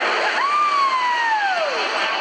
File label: woohoo; Juuzou